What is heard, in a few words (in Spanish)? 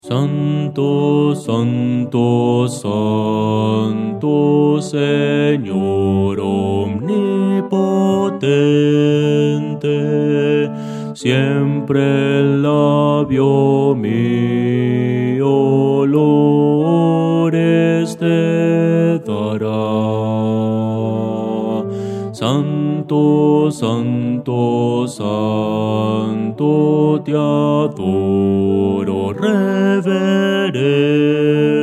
bajo